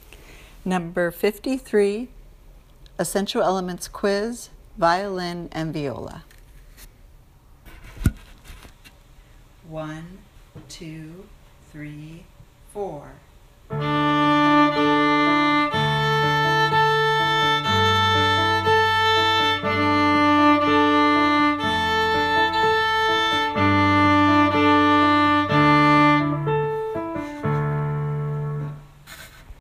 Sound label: violin viola